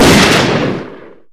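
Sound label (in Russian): выстрел из винтовки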